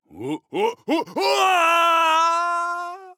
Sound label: Falling; Engineer; Paladins